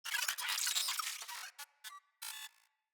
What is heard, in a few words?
Space Drone Attack; Corpus